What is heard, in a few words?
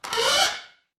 door creak